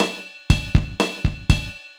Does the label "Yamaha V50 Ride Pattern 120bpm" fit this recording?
Yes